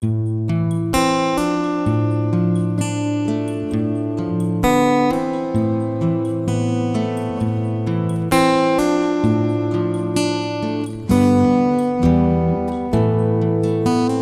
Guitar version; Metro Last Light soundtrack; Echoes of the Past

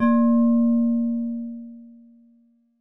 Bottle

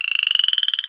frog; ice age scrats nutty adventure

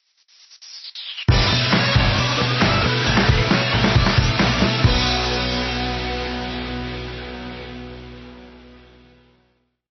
Stinger; Monster High Creeptastic Catacomb Adventures; Catacombs L3

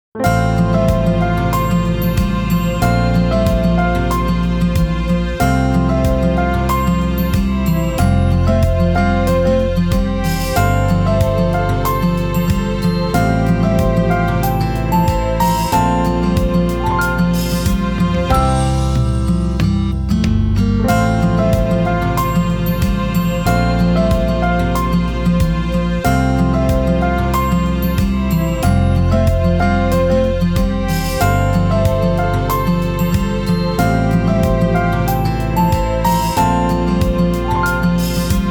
Clickety-Clack; Megami Meguri

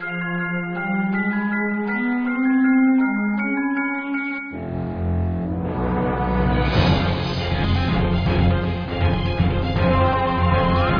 Music

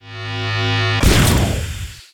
ship Laser Build Fire; timez attack

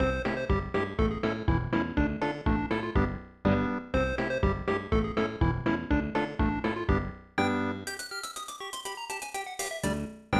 Failure; Lemmings; SNES

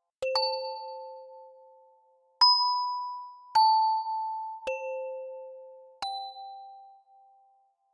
Bells Scare

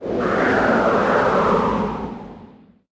Wind

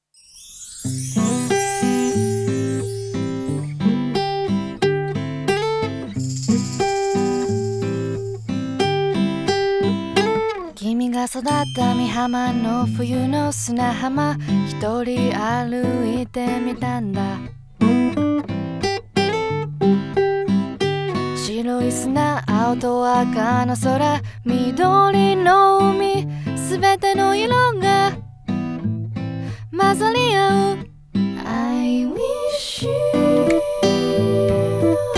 ~Acoustic Version~; Rune Factory Oceans Special CD

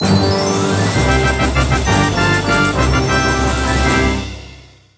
Successful Battle; mario party 5

